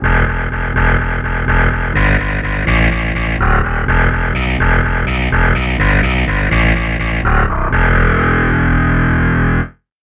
distortion guitar bass

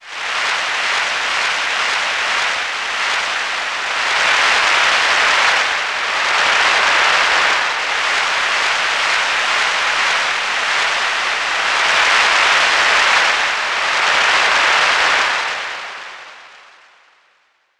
applause

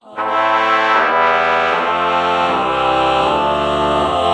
ah trumpet chord morph

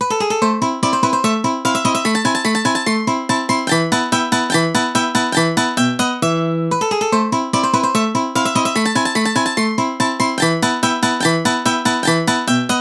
nylon string guitar mozart samples

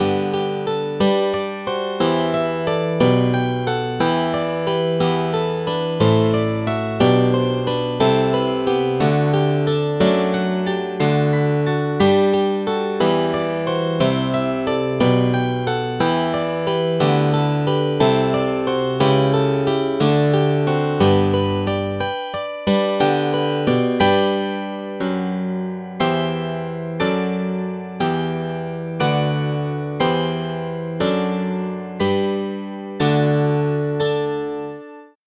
steel string guitar

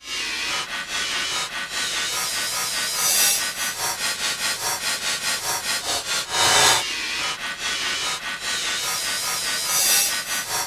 reverse cymbal

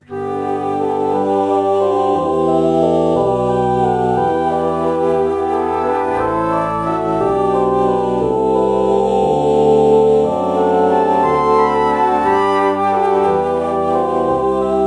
alto flute oh morph

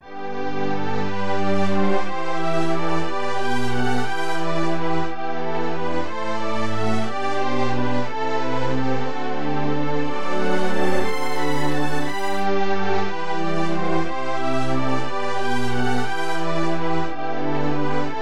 brightness

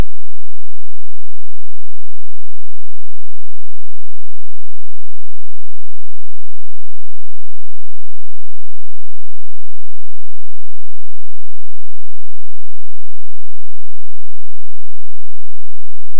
bass lead bass

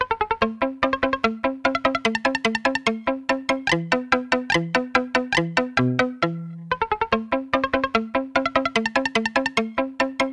palm muted guitar mozart samples

